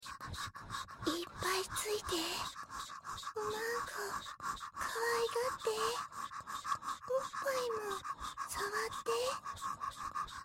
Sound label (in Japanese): シコシコ ハッハッ セリフ 早い